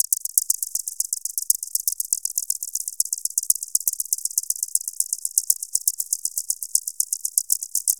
Subtractive Beat

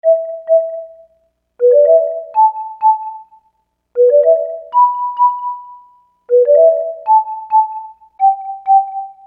Keyboard

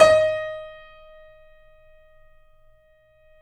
D#, The Piano; InVision Interactive

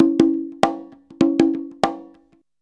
CONGA, Modern Percussion Loops

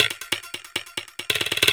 prc 138, percussion loops, Techno; PS-45

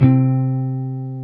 Jazz Guitar; 90's Sample CDs; Club-50 - Foundations Roland; Jaz-Emperor

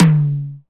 Top, DIGITAL KIT; Roland MV8800

